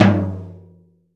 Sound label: big tom drum sample g key, Royality free tom drum one shot tuned to the G note; tom drum samples